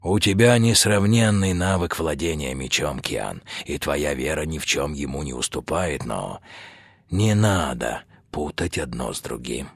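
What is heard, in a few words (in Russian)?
garmon, accordion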